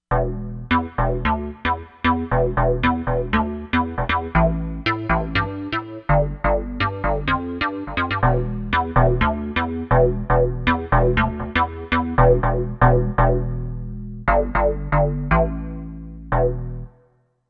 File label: Chiff Bass, Korg MSC-07S Synth